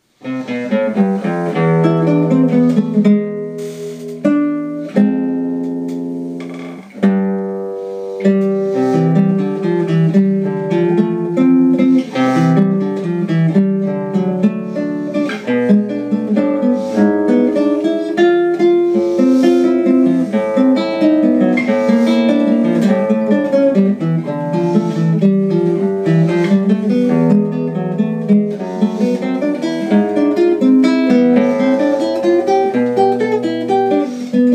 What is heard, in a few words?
preludio guitar; Manuel Ponce